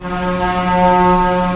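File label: STR C1 PP, strings; Sound On Sound - Peter Siedleczek's Orchestra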